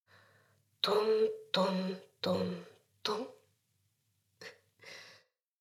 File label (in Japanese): ダウナー風俗 しこしこ